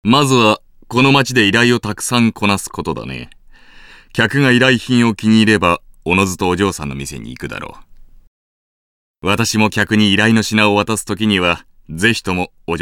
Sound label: saw